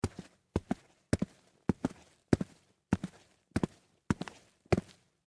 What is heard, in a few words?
Footsteps Tennis Shoes Walk Fast; Human